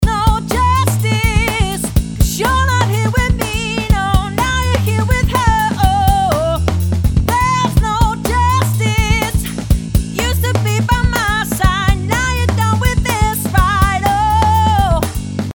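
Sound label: mix snare uad1176 comp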